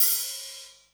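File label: open hi-hat, Drum & Bass; Ueberschall; Drum & Bass Resonance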